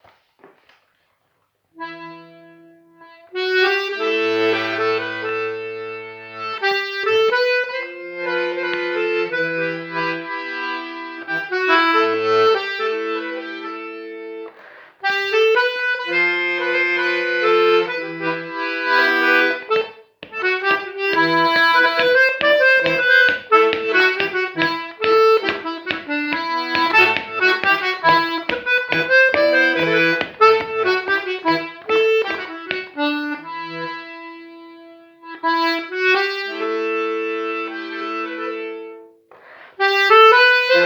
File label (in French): Bal Plinn En Mim; Les-Petits-Packs-Vitry